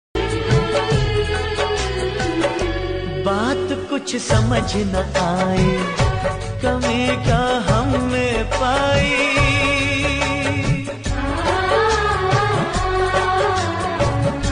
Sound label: 90s sad song